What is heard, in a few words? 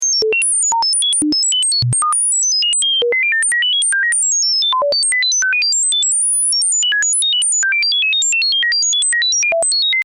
random Sine